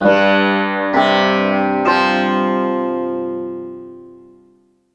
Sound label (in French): SITAR; Mobiclic46